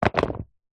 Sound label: foley clothes football soccer boots drop on concrete ground